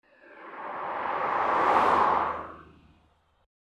Fast car driving past

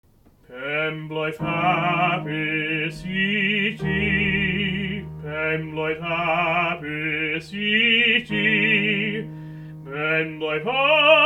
Happy Birthday Song in Welsh

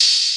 Open hi hat

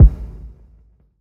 Acoustic reverb kick

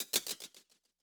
Rattle hi hat

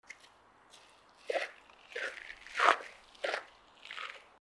Footsteps on gravel

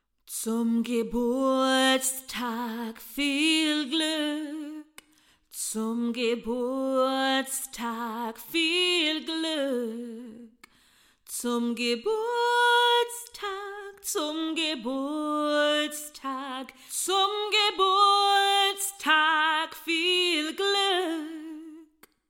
Happy Birthday Song in German Female

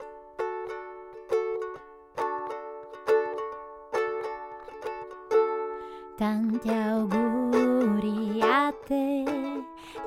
Happy Birthday Song in Italian Female Ukelele

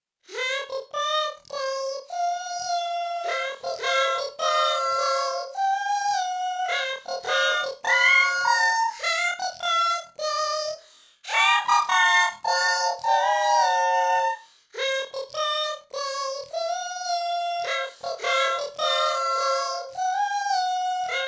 Happy Birthday Song in English Chipmunks

